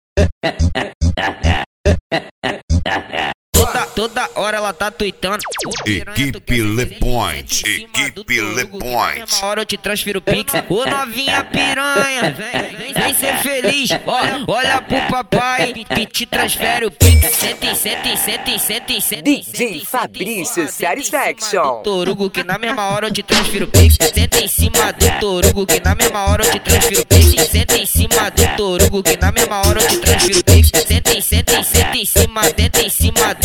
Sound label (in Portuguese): deep house e pisadinha; le point som e acessorios; funk